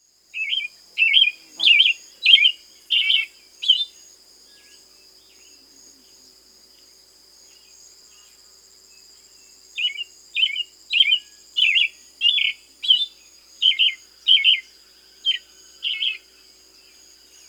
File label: Black-billed Thrush (Turdus ignobilis), Peru; S. America